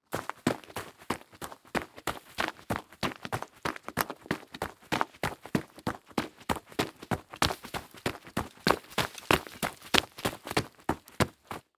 Foley Footstep Golf Cleats Run On Leaves And Twigs; Foley Footsteps